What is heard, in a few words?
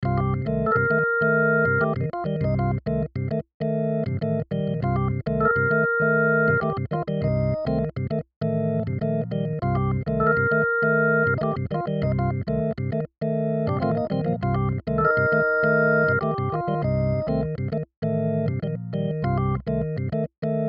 Tremolo On Funky